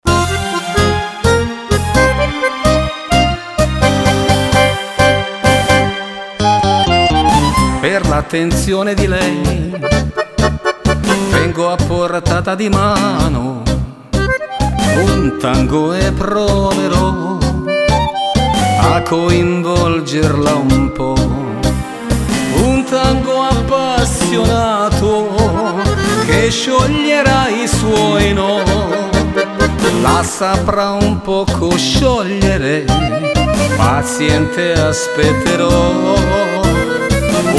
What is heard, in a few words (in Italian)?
Tango appassionato